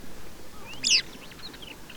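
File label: blyth's pipit, telmen nuur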